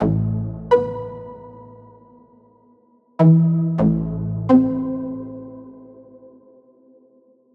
Synth Loop 127bpm Am

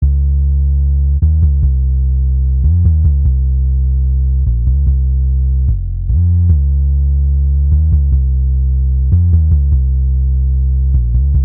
148 synth bass classic richer F min

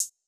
shishy hat

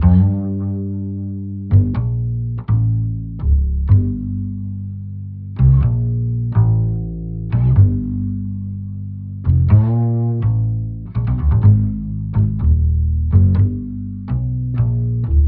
124 G Acoustic Bass

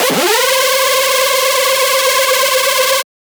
Screech C

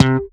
bass slap C

